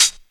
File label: tamed high hat sample d key; hits one shots; hat samples